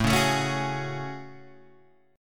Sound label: A Major 13th, down Guitar Standard; strummed; capo=4